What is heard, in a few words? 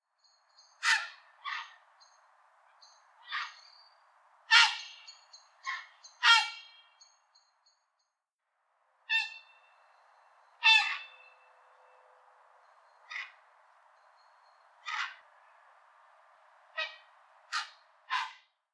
ARDEA CINAREA, grey heron; Birds